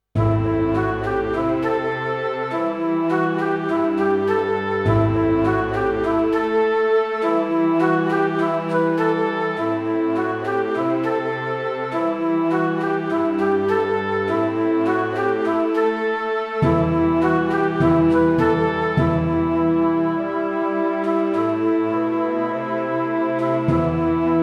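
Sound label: Em Riff symphonic orchestra 102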